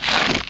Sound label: grind